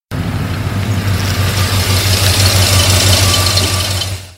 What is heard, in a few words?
truck